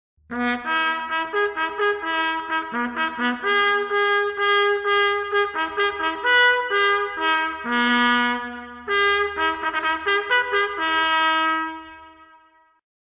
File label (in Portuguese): Formar, toques